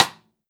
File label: normal hit clap